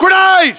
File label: grenade; fgrunt